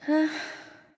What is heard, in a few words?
nv sigh; smite; awilix